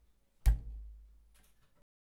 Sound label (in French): Coup Poutre Centrale sourd